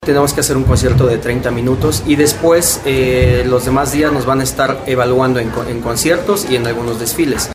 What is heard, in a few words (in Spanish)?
marching band; guiluchos; xvii festival internacional de bandas de musica